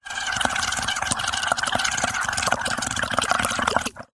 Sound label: drink bubbles blow glass water surface through straw; food